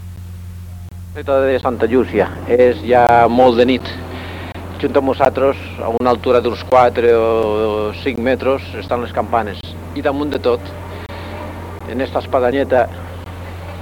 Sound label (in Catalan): campana